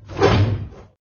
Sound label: GUI Transition Close